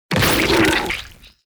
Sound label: Flying Chicken Death